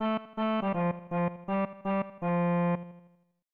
Organ Song; Live A Live; SNES

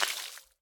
stomp water end; Scrat

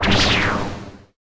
Smoke dead small; The Denpa Men; Android

